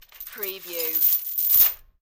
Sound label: Medium Chain Drop To Floor